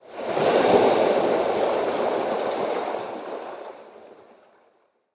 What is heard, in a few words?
waves; Level2 Ice Fields